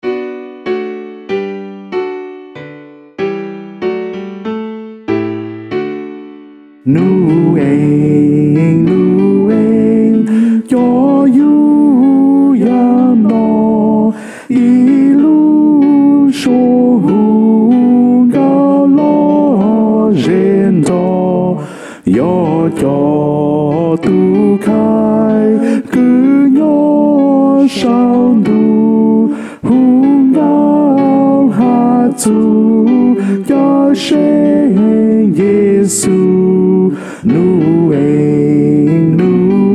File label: soprano